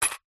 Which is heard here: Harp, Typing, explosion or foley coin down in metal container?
foley coin down in metal container